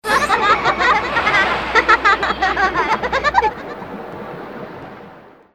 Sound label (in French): Rire enfants; diddy kong